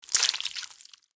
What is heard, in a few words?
Worm squashed organic impact; slug and worm